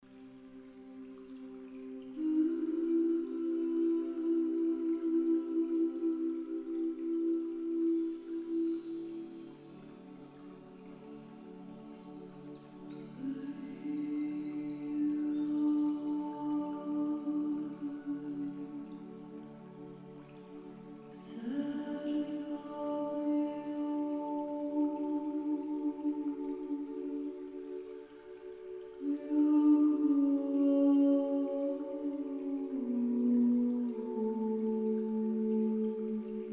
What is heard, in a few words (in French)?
Chant Energetique